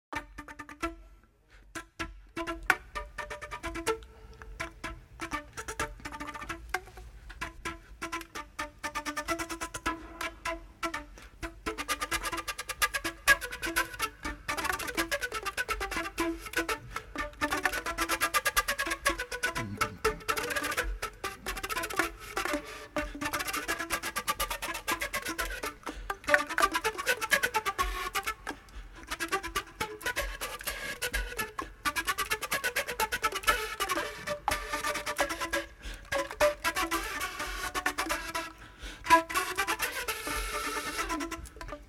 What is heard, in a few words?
Las solas, flamenco flute